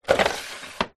household washing machine detergent tray slide open